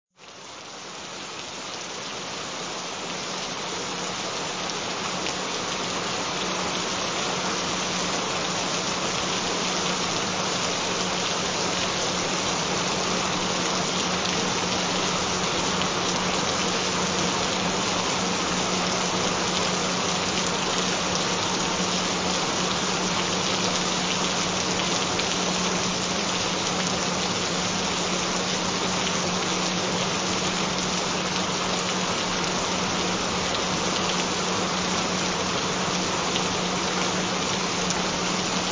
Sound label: Gentle Rain Sounds, Frequencies; Grow Voluminous Wavy Light Blonde Hair